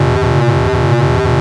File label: alien force field; 1997 MMX LAN PACK (win 95); Army Men